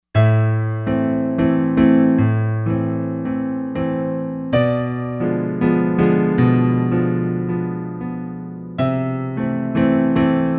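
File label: Piano Dark Grand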